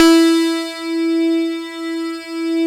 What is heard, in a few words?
PERC BA; Synclavier Sampler Library; KEYS AND GUITARS